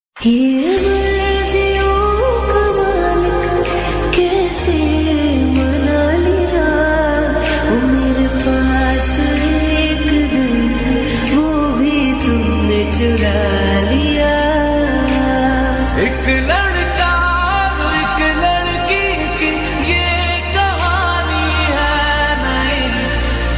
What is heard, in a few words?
love romantic ringtone